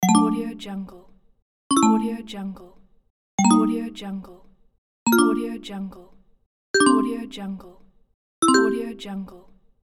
message notification alert

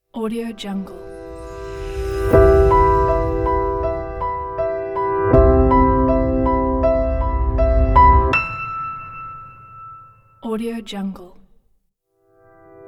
super elegant logo

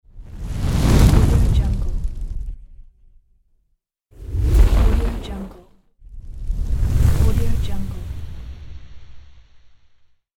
fire whoosh

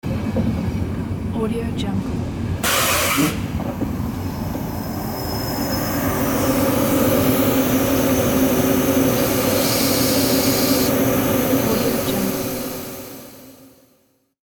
train arriving and stopping

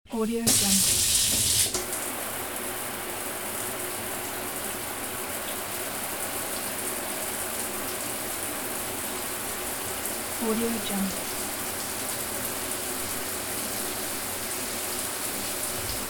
shower running

